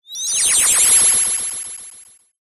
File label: cartoon anime swell power burst energize